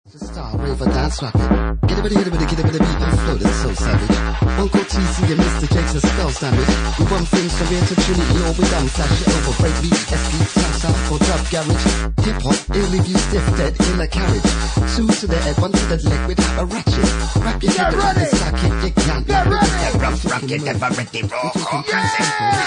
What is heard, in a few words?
DNB